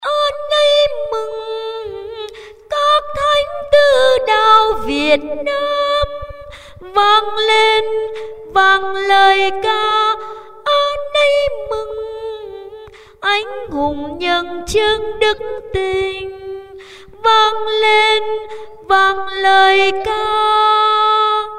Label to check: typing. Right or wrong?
Wrong